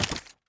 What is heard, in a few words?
button table